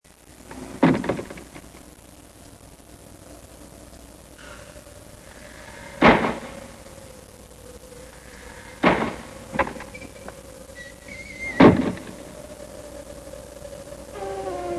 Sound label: Shutters